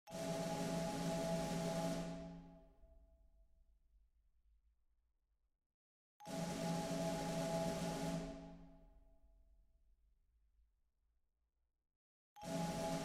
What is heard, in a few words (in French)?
Telephone malaisien